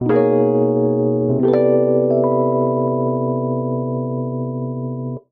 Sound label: Cadence Ascending Chromatic C Major 90 bpm, Electric Keyboards; MIDI Clips; Ableton; Factory Packs